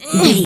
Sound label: voice female effort quick action